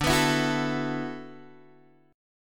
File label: D 6th Add 9th, Guitar, Open G